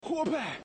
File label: wahpah